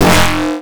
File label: attack Button